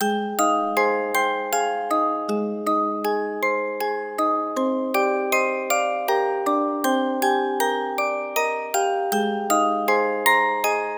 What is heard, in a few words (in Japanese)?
主題歌オルゴールアレンジ; Karakara 2